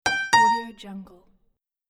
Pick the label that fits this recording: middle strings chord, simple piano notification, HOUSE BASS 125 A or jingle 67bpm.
simple piano notification